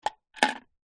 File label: foley wood hollow small set down